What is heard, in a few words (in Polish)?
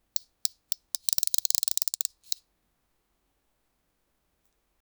parsknicie krokodyla, reptile